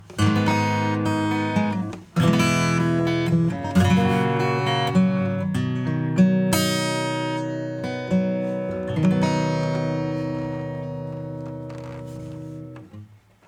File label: acoustic; AMEK